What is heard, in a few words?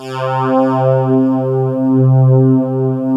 PULSATN, PULSE; PULSENSE